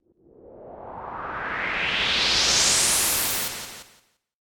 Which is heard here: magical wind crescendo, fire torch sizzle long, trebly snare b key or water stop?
magical wind crescendo